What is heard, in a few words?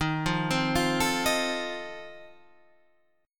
F 7th Eb